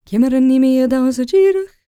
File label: GAELIC, World Voices, GAELIC FEM